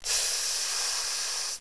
sss; svencoop; sapience

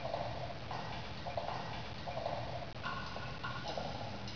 dr drips

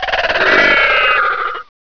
lg scorp die; gearbox

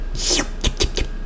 item pickup

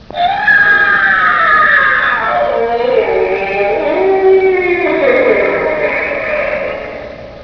groan

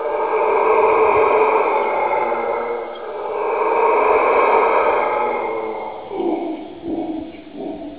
howler; svencoop

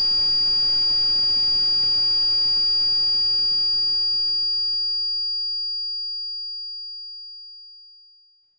ringing ears; firearms

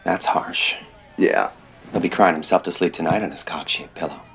w crying

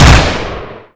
uzis fire, weapons; svencoop

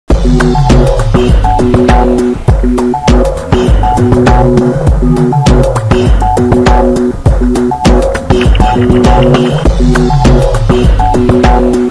music track

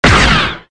blaster fire

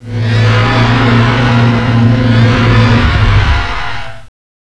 roar; gearbox; renesaur

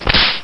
bite attack; rheptor

